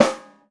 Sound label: acoustic snare sound C key, steel snare drum sample tuned to the C note. Loudest frequency: 2228Hz